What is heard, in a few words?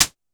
Drum X Hat; Pearl Drum-X